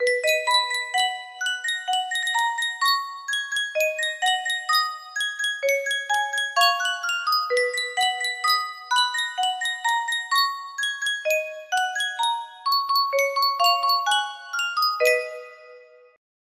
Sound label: Sankyo music box, music box melody